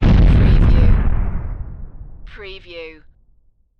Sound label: Underground or water explosion; action impacts explosions; lynne-publishing